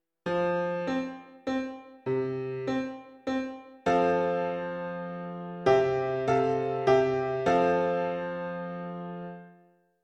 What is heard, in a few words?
Piano